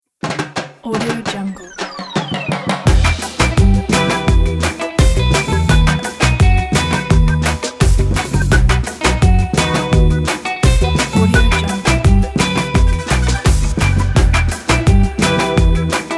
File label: afro reggae beat